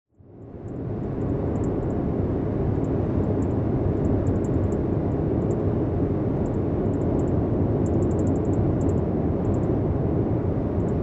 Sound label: i5 southbound road noise; norcal to socal; I-5 southbound complete drive california road noise